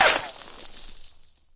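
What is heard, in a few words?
ricochet; mistake coop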